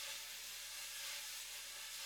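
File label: slow brush; Northstar - New Gold VOL-2; 30S BRUSH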